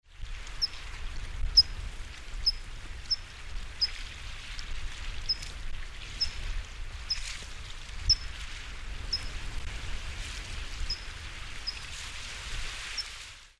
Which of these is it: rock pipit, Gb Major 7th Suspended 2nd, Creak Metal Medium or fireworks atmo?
rock pipit